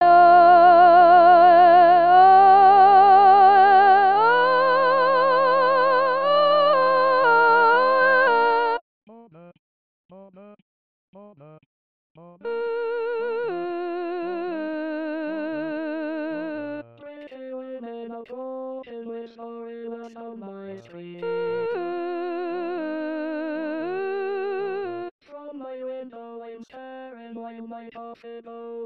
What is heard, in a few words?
soprano